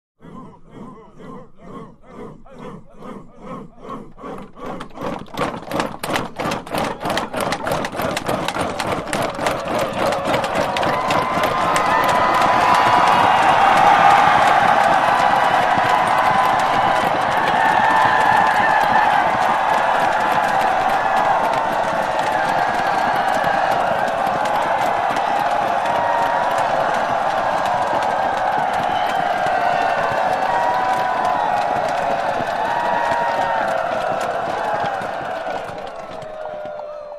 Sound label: Infantry Zulu Chant, infantry cheers